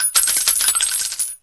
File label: shells land